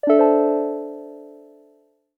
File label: end Quest; song of the myrne what lies beneath